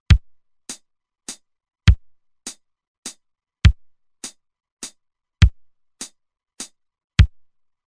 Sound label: Waltz, Roland TR-505; Factory Rhythms